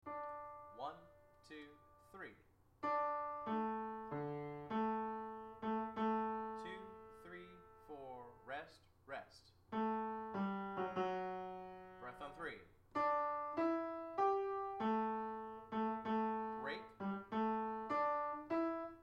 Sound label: Hall Tenor, hallelujah chorus